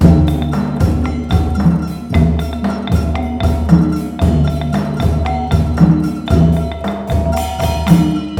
MALAY R, Heart of Asia

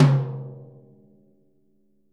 Living Drums; Sampleheads; Peter Erskine

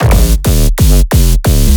super noisy, bass

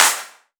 Claps; Vengeance - Essential Clubsounds Vol.5

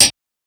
Hats, Alesis Performance Pad

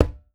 quinto, congas; Voice Crystal - Steve Reid Definitive Percussion

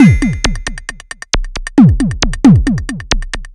Drums Strange

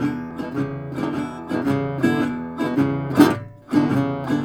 Blues Guitar Samples, riff; ILIO; Fretworks; SIMMS RIFF

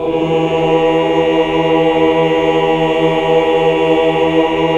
AAH, AAH OCT; Best Service; Hallelujah

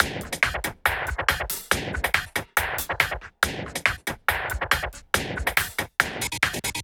Loops 140 BPM Hihat Percussion Loops

